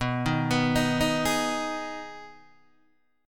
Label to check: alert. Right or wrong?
Wrong